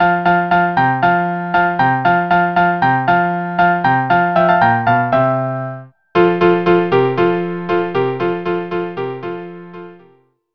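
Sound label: trio 1st movement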